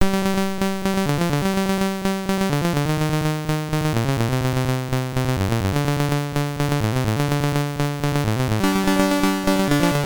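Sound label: Loader (SID Stereo); ocean loader; commodore 64; Ocean Loader #2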